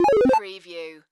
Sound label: Chime Musical Fast